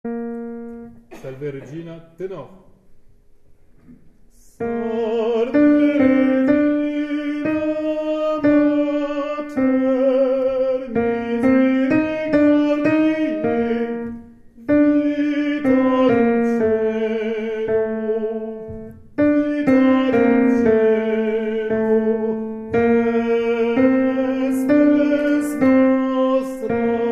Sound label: Tenors; Salve Regina